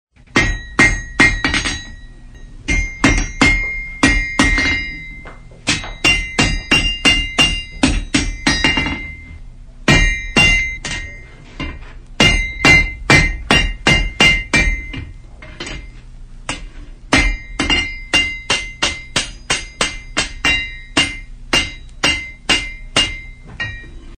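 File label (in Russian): кузница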